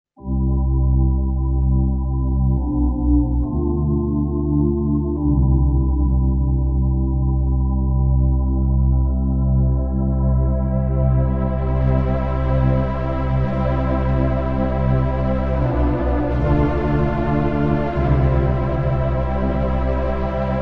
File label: PAD Mod Arp, yamaha sy77; sy77 pads